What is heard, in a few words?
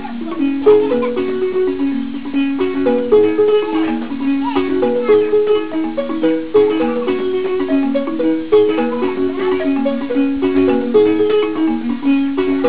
Xylophone; Lawra; Navrongo